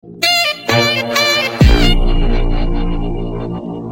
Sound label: ai fruit sax